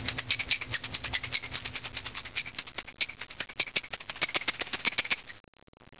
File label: meinl wah-wah shaker